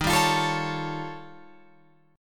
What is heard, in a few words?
D sharp 9th Flat 5th D sharp, Guitar Open F; D#9b5; strummed